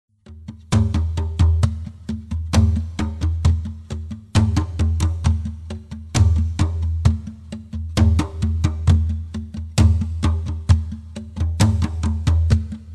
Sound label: kpanlogo